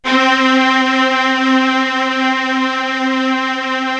strings C3, KORG DSS-1; ProSamples vol.55 - Retro Sampler

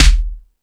Discoball, drum machine

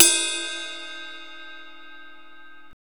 ride D# 5; Greytsounds VOL-1; SDX HATS+CYM

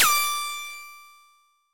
Hit, Stab & Hit; Bitkits

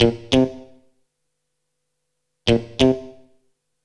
FK GTR, FUNK; USB Soundscan vol.47; AKAI

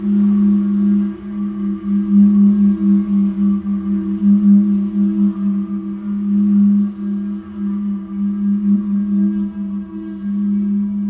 ambience

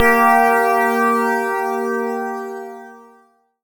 BELL-GONG, A#; Big Fish Audio

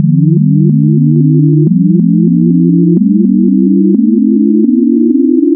Add Partials 3N C, wavetables Additive; Architecture 32

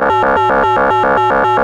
Moog C3, Synthesizer; Hollywood Edge; Giorgio Moroder Rare Synthesizer Collection

